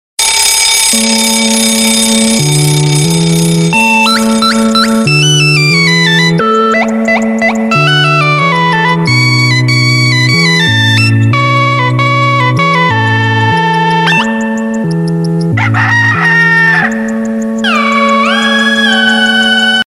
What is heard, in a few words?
crazy clock ring tone